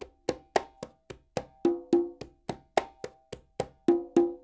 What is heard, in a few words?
NYC Percussionworks loops; Sampleheads